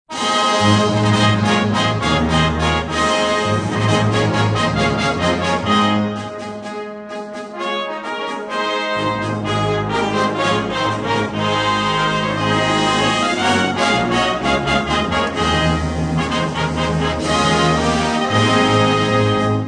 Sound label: fanfare